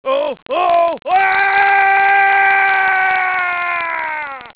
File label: DIE; pang